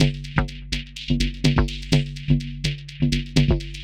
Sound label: perc 125, Percussive Loops 125 bpm; captivate